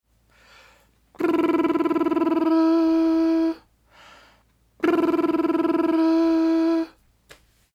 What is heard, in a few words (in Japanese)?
裏リップから裏成功